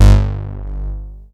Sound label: BASS G1; R100M BASS; Best Service Dream Experience